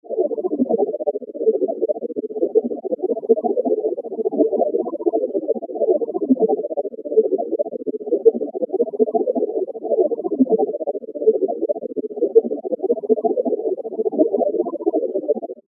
bubbly surface noise

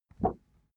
cardboard wobble short medium

